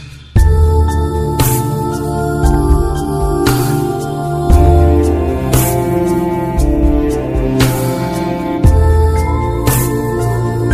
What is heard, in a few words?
best bgm ringtone